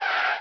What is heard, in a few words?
skid; SpacHawk